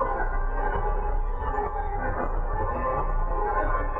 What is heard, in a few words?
Tonal Re Draw, sparse soundscape